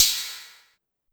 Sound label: Ride 808, Pitched Down; Clavia DDrum-AT